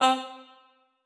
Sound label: Wet One Shot - C, Tonal Vocal; Cymatics; Euphoria Vocal Sample Pack